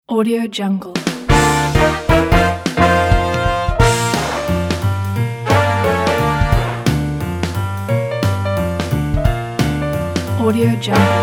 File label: the big band swing jingle